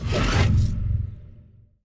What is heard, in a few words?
On Release; Ion Fang